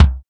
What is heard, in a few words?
KIK, Real Kiks; Club-50 - Foundations Roland